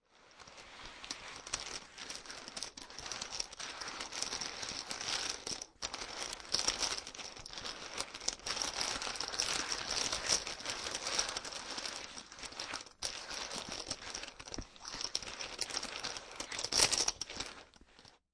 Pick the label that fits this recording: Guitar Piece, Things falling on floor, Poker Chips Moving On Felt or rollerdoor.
Poker Chips Moving On Felt